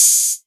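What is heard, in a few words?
808 Hat Open; Novation Drumstation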